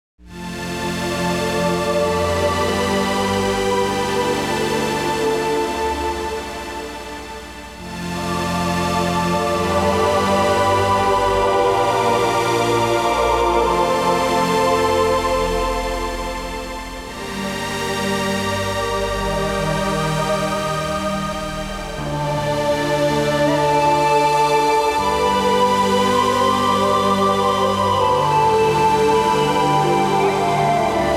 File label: Forest Hymn (Ambient Mix); The Nations